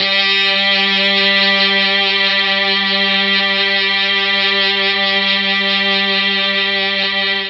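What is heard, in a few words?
G2 strings brass, Mellotron; Mike Pinder - Mellotron Archive CD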